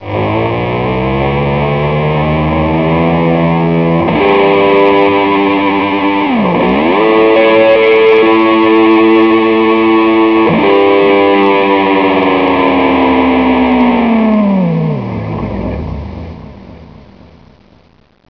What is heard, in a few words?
guitar; svencoop